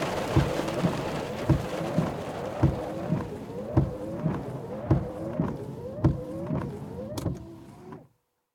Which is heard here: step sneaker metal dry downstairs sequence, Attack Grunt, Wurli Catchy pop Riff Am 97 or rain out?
rain out